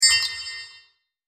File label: slots line